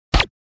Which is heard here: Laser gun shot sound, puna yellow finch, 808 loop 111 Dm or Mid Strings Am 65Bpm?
Laser gun shot sound